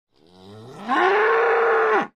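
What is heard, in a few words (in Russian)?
korova golos, cow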